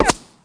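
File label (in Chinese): 成功防御; 红色警戒2/超级武器音效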